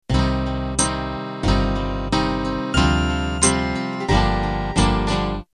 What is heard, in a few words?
8Beat slow 70s Intro